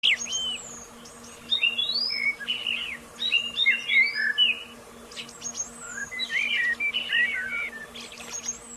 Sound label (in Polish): odgłosy łąki